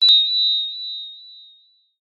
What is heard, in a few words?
notification alert prompt bright chime ping; zapsplat